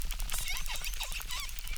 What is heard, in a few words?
Chirp, acquarium